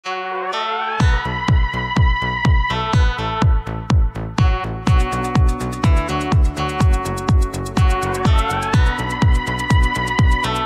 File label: eurodisco; new eurodisco 2024